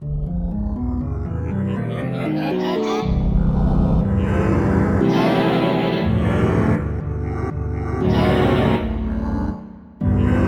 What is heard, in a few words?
Voicyst; Onj's Soundfont